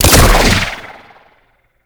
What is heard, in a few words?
Exalted Bow Fire B; Tenno; Ranger